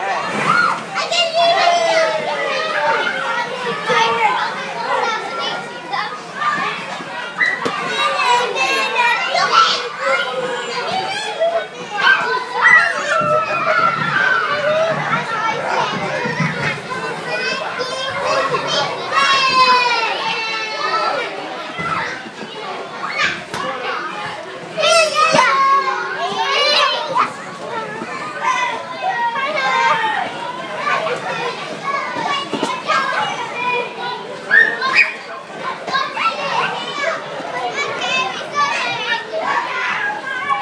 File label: Saturday morning soft play birthday party